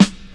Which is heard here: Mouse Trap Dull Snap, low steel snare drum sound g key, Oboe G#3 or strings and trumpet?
low steel snare drum sound g key